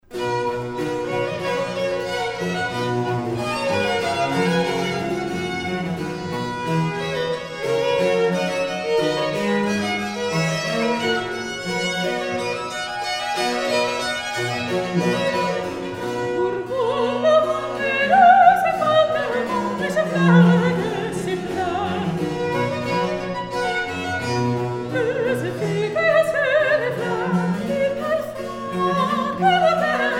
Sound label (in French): Air gai; Philomele